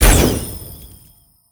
archer shoot light arrow; Aragami

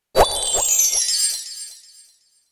Twister-Win

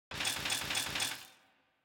Charge scrap

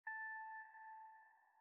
City Reprise Stinger

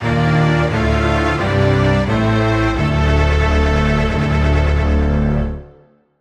Item Acquisition Fanfare (No SFX); Super Metroid

